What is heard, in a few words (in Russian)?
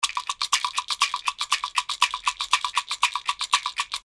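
шейкер мелодия ритмичная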